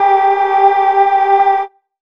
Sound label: syn, Chillout Synth Atmos